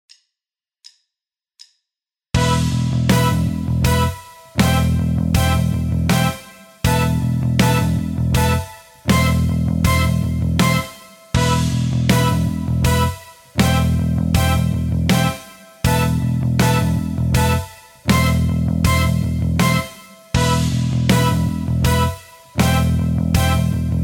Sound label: backing, guitar; Rockschool; Guitar Techniques 2018 Issue 289 December